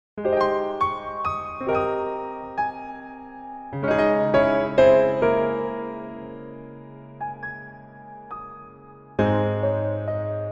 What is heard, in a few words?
Piano MR, 멜로디MR